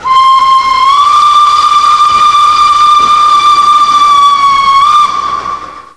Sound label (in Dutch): Fluit vervormd